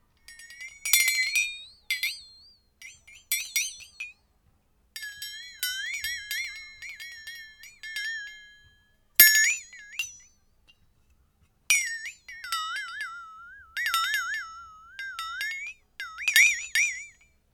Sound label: flexatone